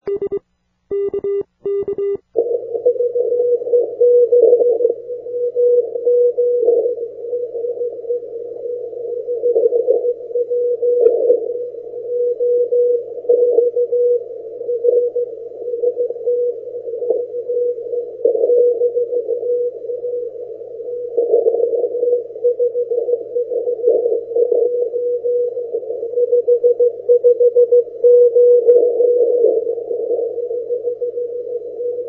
80m CW, Another example of Peters big signal from South Africa.; ZS1JX